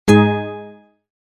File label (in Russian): Звуковой сигнал восклицания